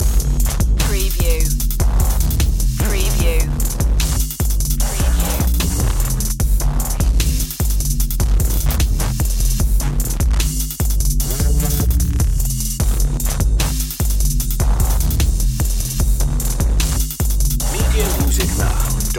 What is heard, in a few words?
kick my bass loop